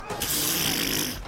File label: pffft